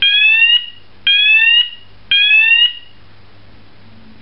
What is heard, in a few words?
iso8201 intermittent code 3 sweep, fire alarm; garrysmod